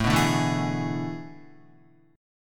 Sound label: A Major 7th Suspended 2nd Suspended 4th, Guitar; AM7sus2sus4 strummed; JGuitar